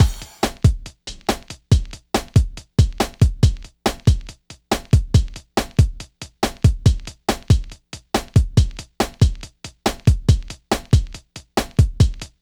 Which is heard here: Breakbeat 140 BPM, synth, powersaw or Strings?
Breakbeat 140 BPM